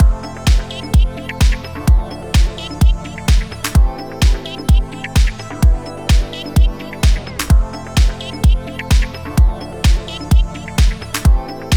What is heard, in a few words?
Music Loop